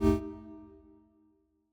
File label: fail